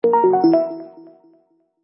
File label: lose